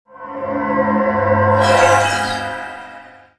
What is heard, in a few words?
magic mindcontrol